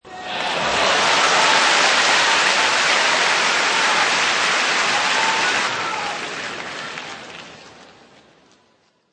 Sports Crowd Cheers, Crowd cheers at sporting event; Crowds; Voice